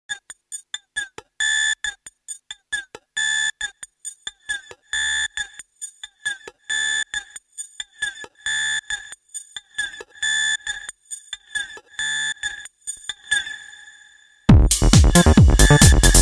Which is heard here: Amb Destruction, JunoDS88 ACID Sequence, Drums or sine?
JunoDS88 ACID Sequence